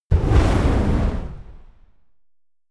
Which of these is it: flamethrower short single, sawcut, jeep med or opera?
flamethrower short single